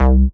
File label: Bass Shot C; Mutekki Media; Vengeance Electroshock Vol.2; Bass Shots